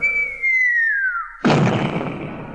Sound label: Bomb; Mad Money; TIPro